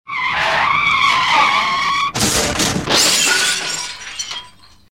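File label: sounds of car brakes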